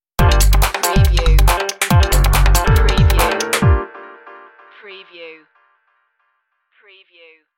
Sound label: keen tweaks stinger